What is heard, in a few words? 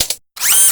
look pose; tonate